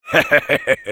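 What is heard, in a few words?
laugh evil, French; Rire méchant 1; Heavy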